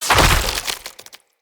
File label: Corrupted Slug Foley Death; Creatures; Movement